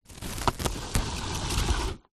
household plastic food wrap cling film pull from dispenser